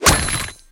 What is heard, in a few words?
hammer multi